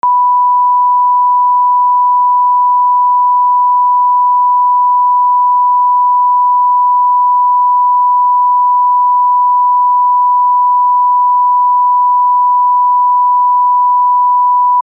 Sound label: Display Test Signal; ultimate vhs pack; Videohive